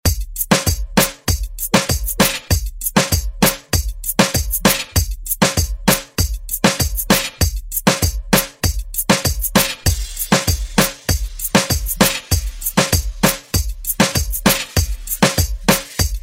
Moombahton Redrum

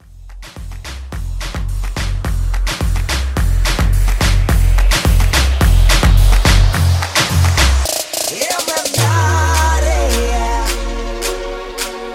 Moombahton Bootleg

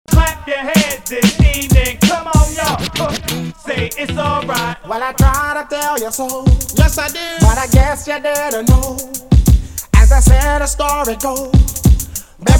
Classic Hype Party MashUp

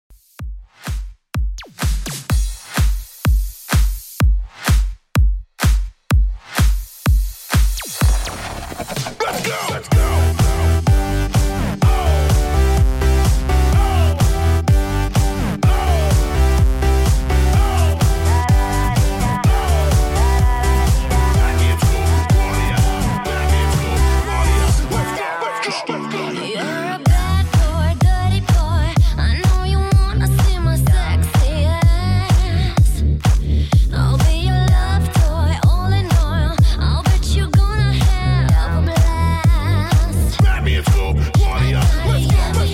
Dance 126Bpm

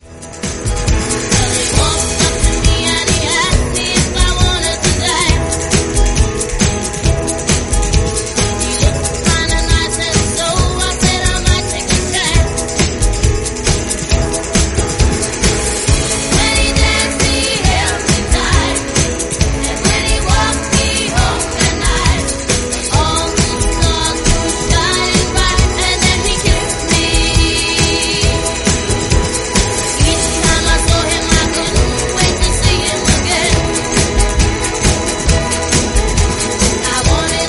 REDRUM 136bpm E

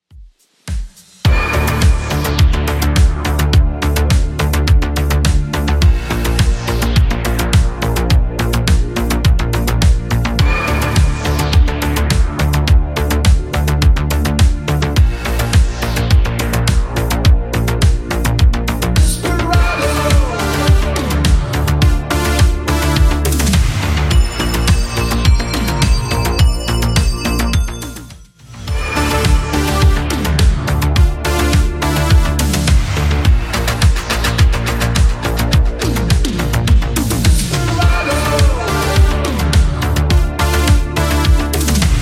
80s Redrum 105bpm

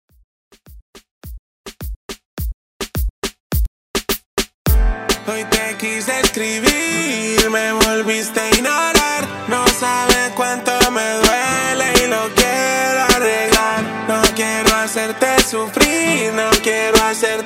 Reggaeton; Redrum

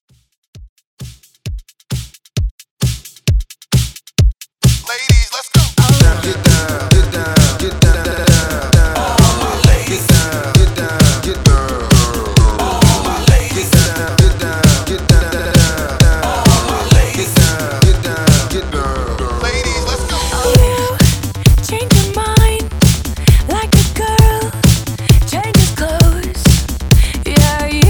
Pop; Redrum

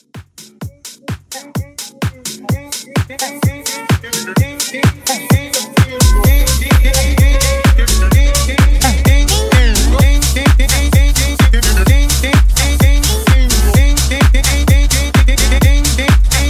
House Xmas 128

